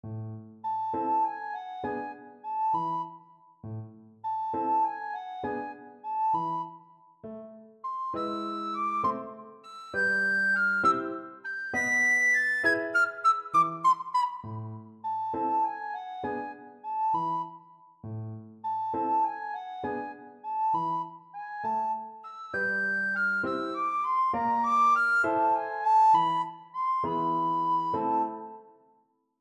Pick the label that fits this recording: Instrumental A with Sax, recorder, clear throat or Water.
recorder